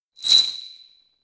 Startup, psp system sounds

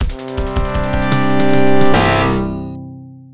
Unused Jingle; Michael Jackson's Moonwalker; Arcade

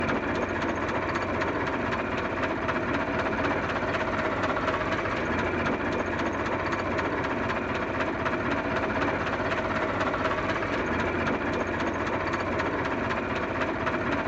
Car Ford T Idle; The 39 Steps

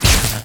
Weapon Magic Critical; Inotia 3

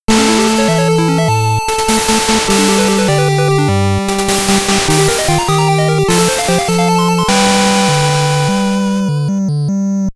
Game Clear; mega man super fighting robot 2015

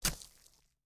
Death Spikes

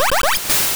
Leech Seed Damage

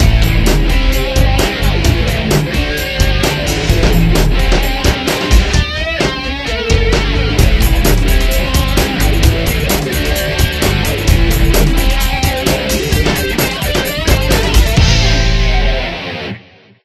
Cheerleaders Dance Theme; kurt warners arena football unleashed ps1 gamerip 2000

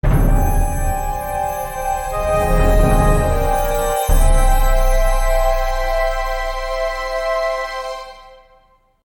Travelling Jingle; Asterix at the Olympic Games

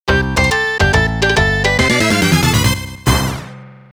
Area Clear; Ganbare Goemon Sound Treasure Box

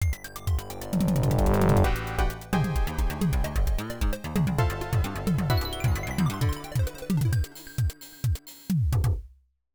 system music opening jingle; FMP Music Disk 9 15th Anniversary Edition 2009; FMPDISK volume 9

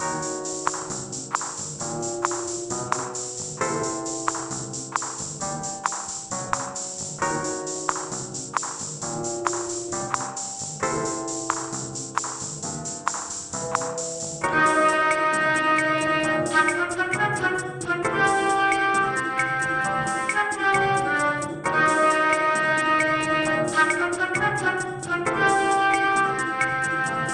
Tension - muzak; Judge Dredd: Dredd vs. Death

